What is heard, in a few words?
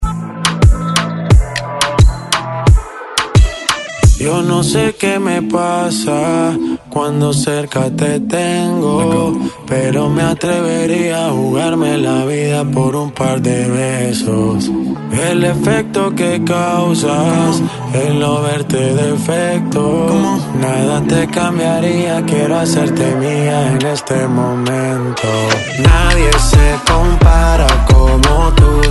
Reggaeton 8A 88 Bpm; DJDOLLS